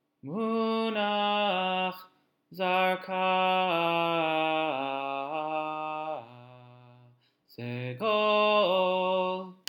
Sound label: Torah Trop Pattern